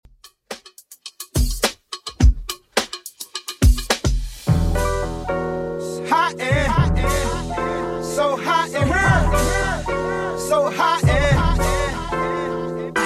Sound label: Redrum 106bpm